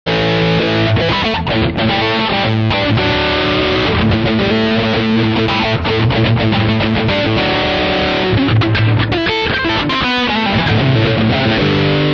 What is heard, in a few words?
Dualtone gain, guitar